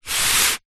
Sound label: sport exercise mat foam hand rub